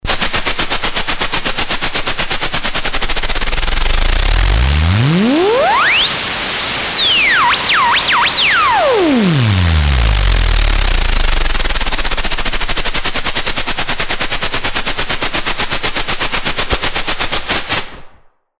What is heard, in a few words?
Plan-B Model 25